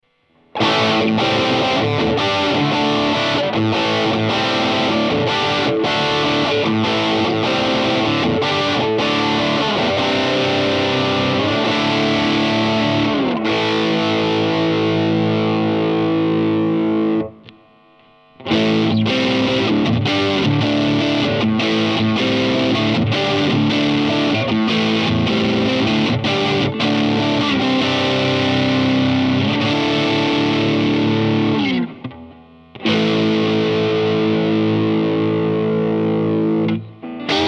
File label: drive